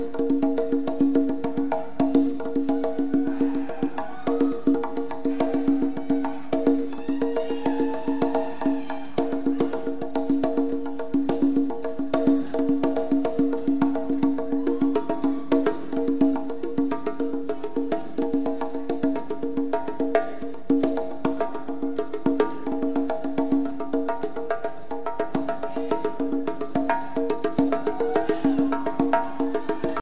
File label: Drums